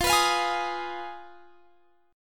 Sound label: F Minor 7th Flat 5th, chord; Fm7b5; strummed; x,20,21,22,21,23